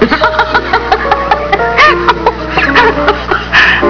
ahha

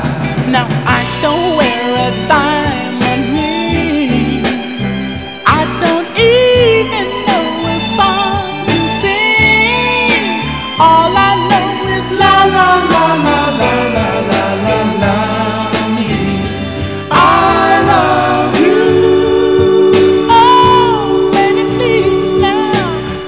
lalalala

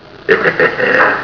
hehehe

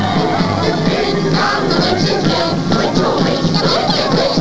dingdong

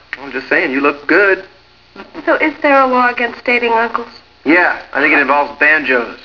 banjos

